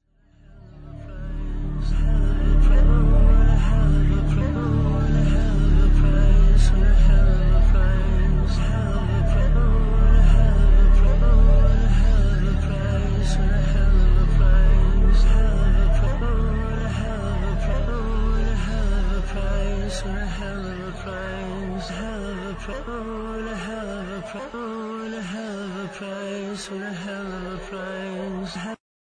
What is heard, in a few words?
Filter reverse; Backmasking: «Oh, what a hell of a place... hell of a place...»